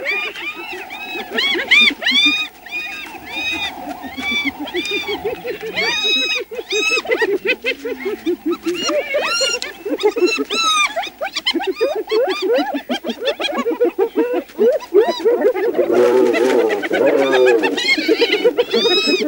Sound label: Laughing Hyenas